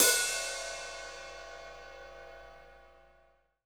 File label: CYM, JAZZDRUM; Ueberschall; Drum & Bass Flash Trackz